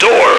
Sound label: door; radio; male